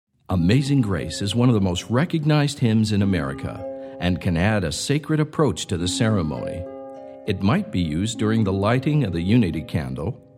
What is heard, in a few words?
Harp